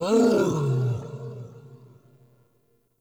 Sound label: Cannonball Slam Vocal; Powers; Bromius